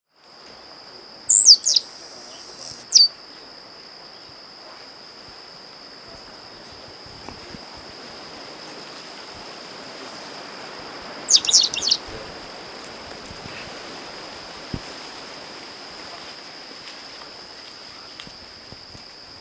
warbler finch

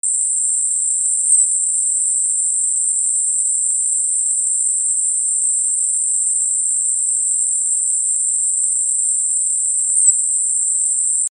great inca finch

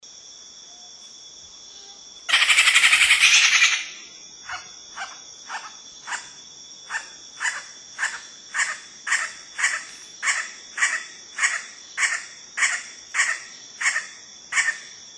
green backed kingfisher

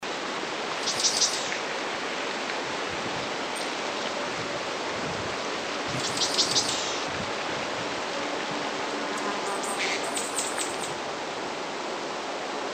peg billed finch

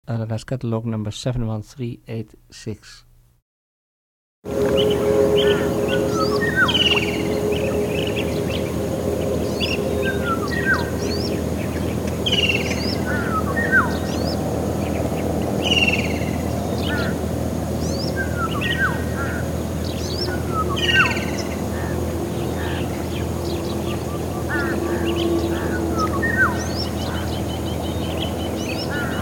black capped kingfisher

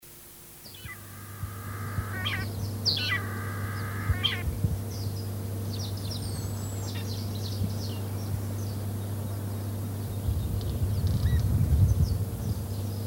vegetarian finch

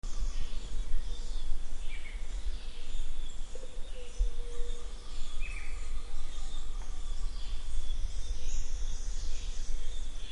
chestnut coloured woodpecker

